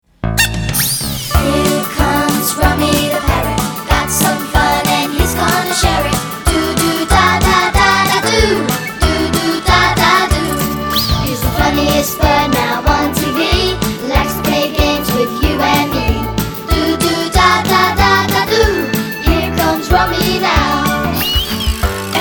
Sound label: Jingle; Rummi